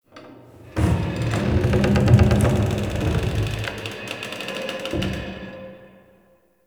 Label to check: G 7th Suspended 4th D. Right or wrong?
Wrong